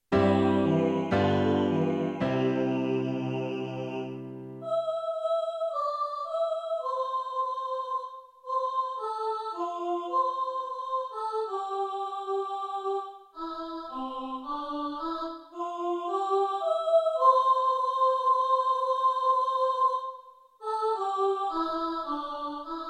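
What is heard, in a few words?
chorus soprano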